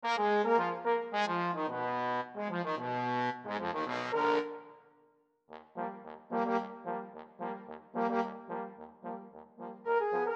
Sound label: Low Brass Trio